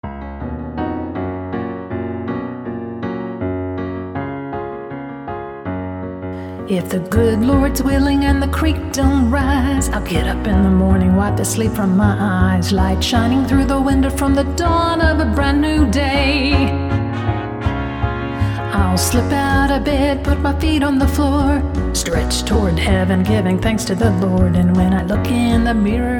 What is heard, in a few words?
Vocal